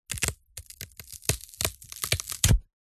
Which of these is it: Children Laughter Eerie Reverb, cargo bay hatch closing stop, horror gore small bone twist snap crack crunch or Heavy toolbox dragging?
horror gore small bone twist snap crack crunch